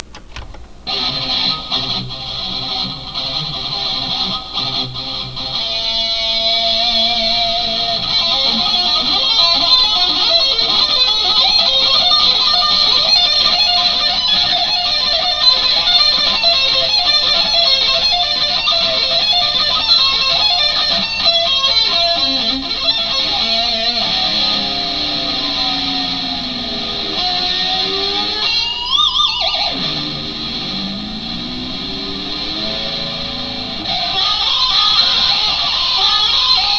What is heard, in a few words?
metal